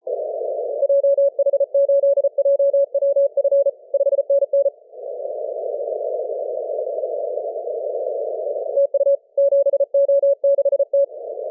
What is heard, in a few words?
cw